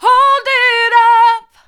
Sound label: hold it up hard, vocal